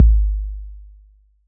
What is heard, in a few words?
BD 808 Decay